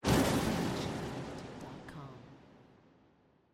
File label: Spell Blast; SoundsCrate